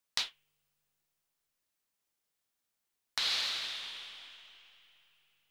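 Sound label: hi hat closed open; KORG - Sound Sampling Collection VOL-5 - Drums & Bass Fusion Funk Effect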